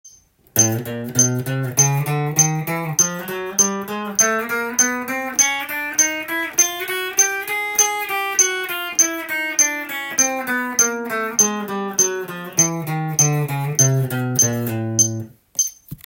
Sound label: easy picking, guitar; easy picking practice tab